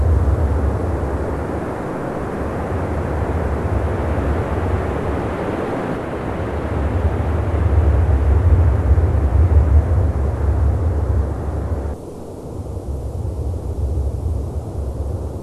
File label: WFR audio emfisis